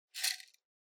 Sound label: Spice Mill Pepper Shake